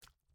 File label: Footsteps Water; Main Character